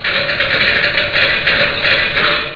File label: Machine Rattle; Let's Discover Michigan!